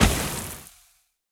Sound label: Explosion; Fizzle